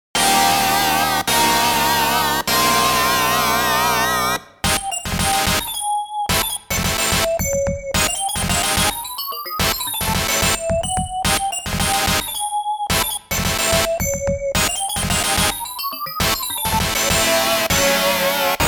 Stage Clear; dead land dezaemon kids ps1 gamerip 2015

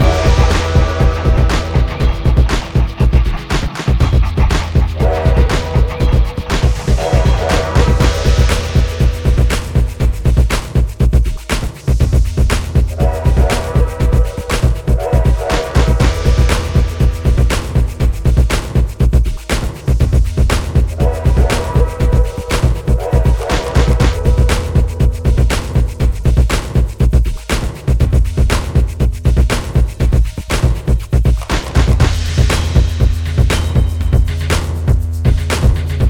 Train Drums; Gang Beasts; Switch gamerip 2021

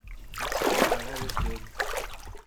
Hub Amb; Levels Hub Swamp 06 Twitch Skijump; The Princess and the Frog; Wii

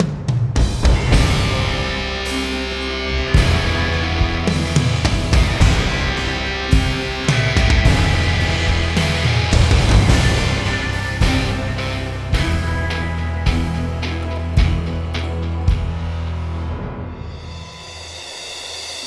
Boss Celebration Intro; Chillheim

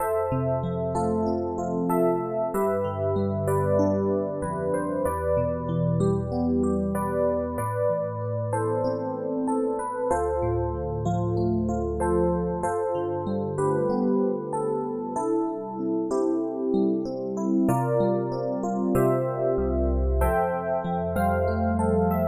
BGM; Adventure Player; psp

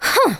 Emote Effort; The Princess and the Frog; wii windows; Twitch Skijump

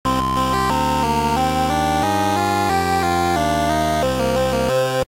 gameover; Tcheco in the Castle of Lucio

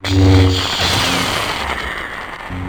Menu (Begin Draw)

